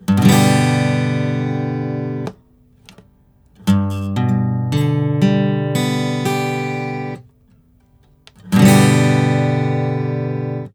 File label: Major or Minor